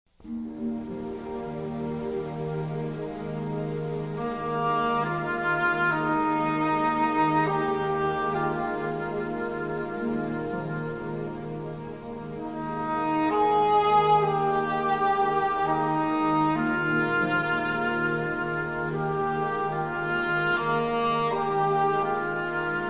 Philharmonic Orchestra + Choir

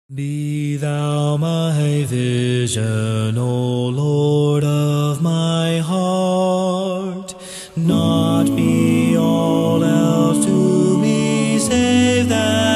A Cappella

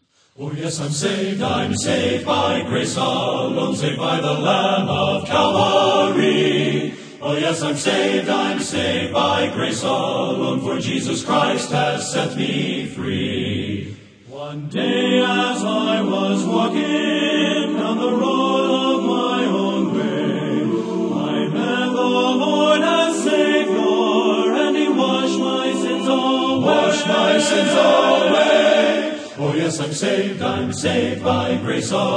Men's Choir

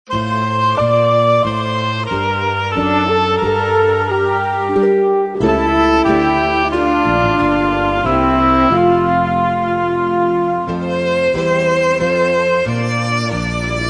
Instrumental Hymns

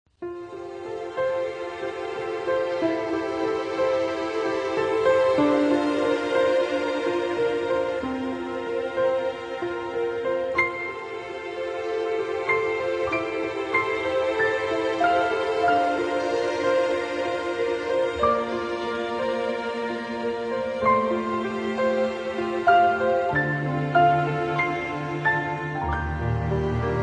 Orchestra

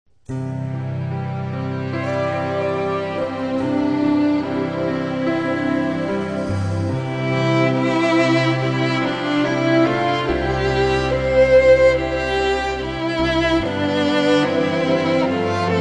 Instrumental Praise

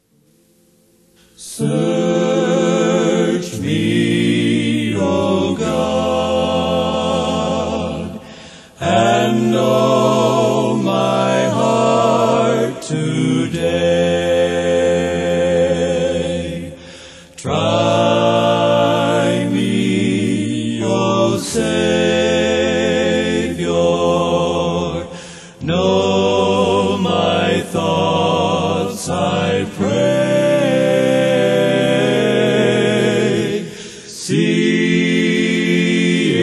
Old Hymns A Capella

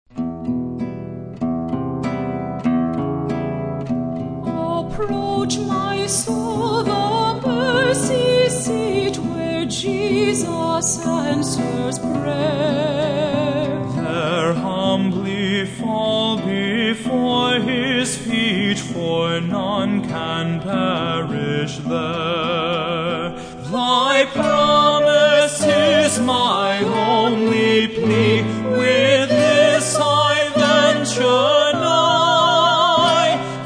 Singers And Orchestra

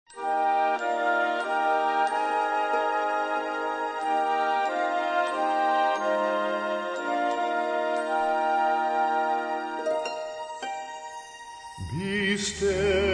Trio, Be Still My Soul

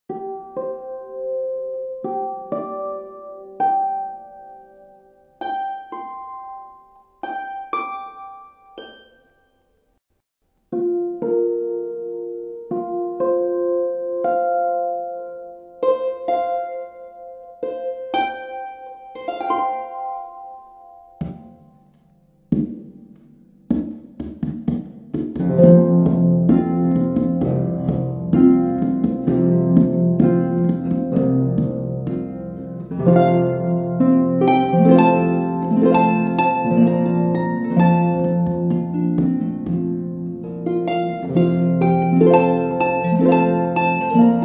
christmas carols on the harp

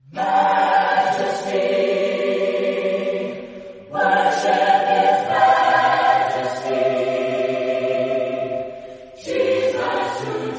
Chorale, Voices in Praise acappella

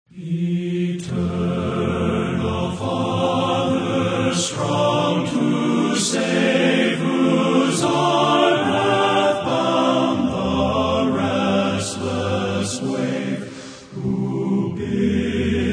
Men's Chorus

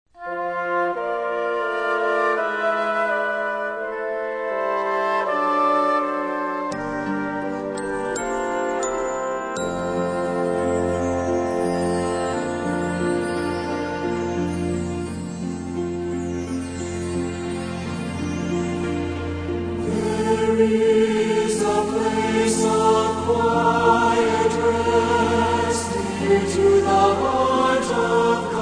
Choir And Orchestra

